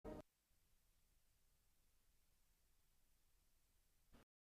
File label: Euphonium Quartet; Canterbury Brass Band; Upchurch Concert